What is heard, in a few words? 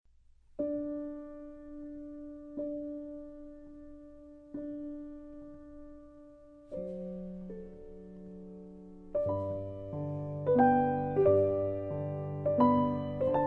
piano